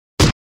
Kick Body Hit With Thud; Fights Bodyfalls

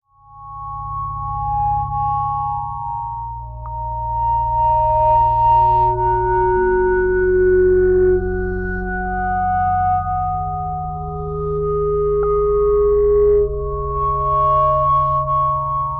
Click Melodic; Beds

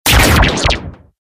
Shake Blast; Best Of N2O Library; Breakers & Transitioners

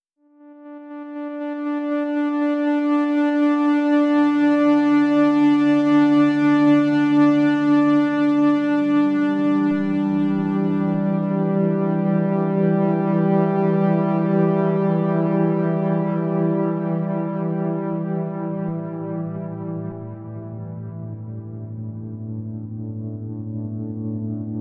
FM Melodic; Beds

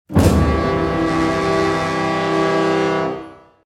Music stinger - Low orchestra brass chord; Hollywood Edge Sound Designers Tool Kit

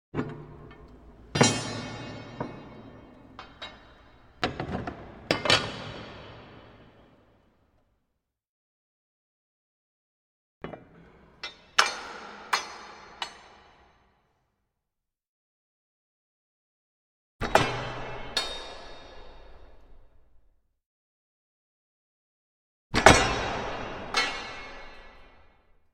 Metal Door Stay Slotted Into H; Hollywood Edge Historical Series; cd4 Castles Palaces & Monasteries

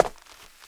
HARDWOOD, FOOTSTEPS; 1000fx

